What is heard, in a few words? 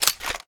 sm reload xmag magout; gmod